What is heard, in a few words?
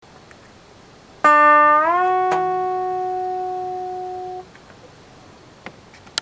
bend 2on